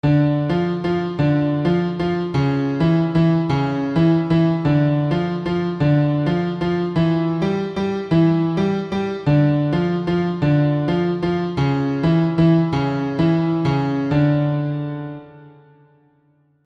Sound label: bad melody